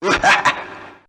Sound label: laugh